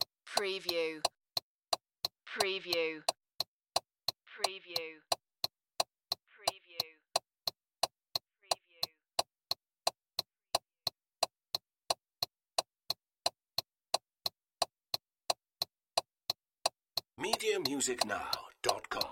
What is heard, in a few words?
Auto Turn Signal; sound effects bible